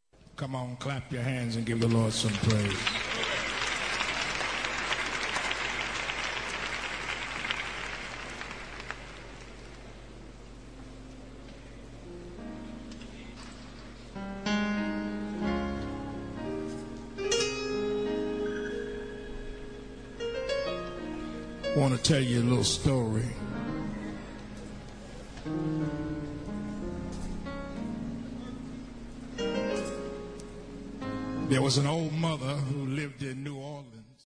mass choir

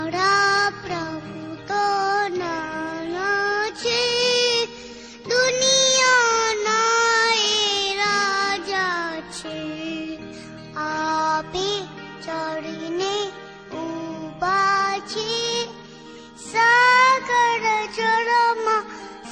Ska

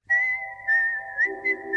nurse whistle sms

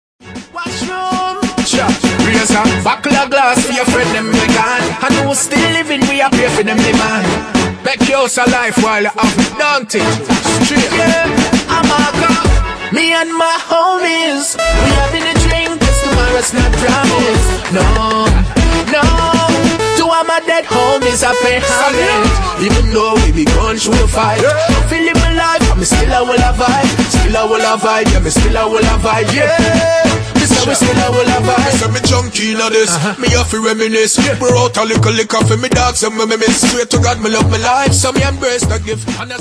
riddim

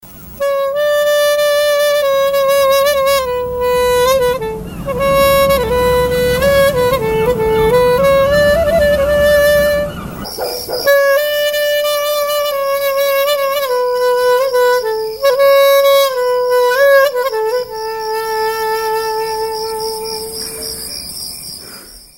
clarinet turkish; ringtones

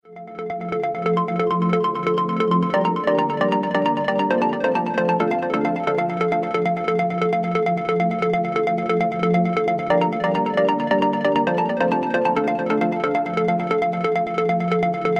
Trance sms

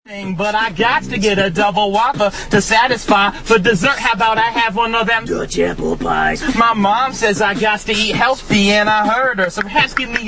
drive thru rap

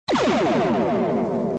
photon torpedo 3 blasts; star trek

